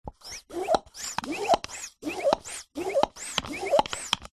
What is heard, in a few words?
household balloon pump pumping action valve pov; zapsplat